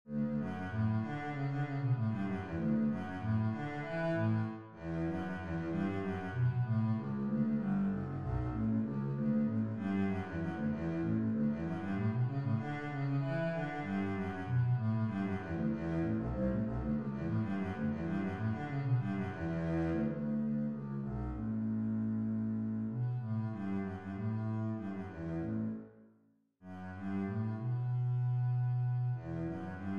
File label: Brandenburg bass, string bass